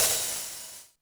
CYMBAL, Yamaha RM 50

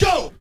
Vinyl

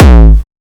kik

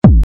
kick

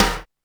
snaredrum, Yamaha RM 50

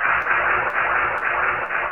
Out Of Breath; Sound FX

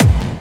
Medium, kicks

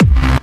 Kick n Bass

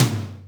Yamaha RM 50 Toms

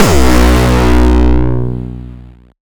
kick

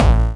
Bombo Hardcore, kicks

